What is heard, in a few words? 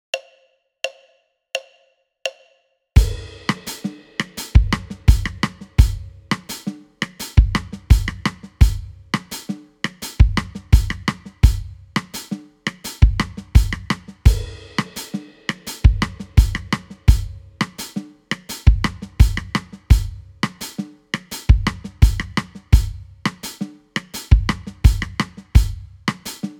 Drums